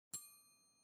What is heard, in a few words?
Pizza Cutter Cymbal